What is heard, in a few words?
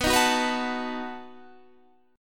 B 7th B down Guitar Standard; B7 strummed; JGuitar